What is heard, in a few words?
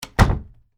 Door Close French, Foley, Door, Close, French, Door; Miscellaneous Doors